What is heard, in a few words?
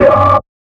misc oneshot, FX; GhostHack